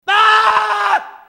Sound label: Chainsaw Battle Cry; silent hill 2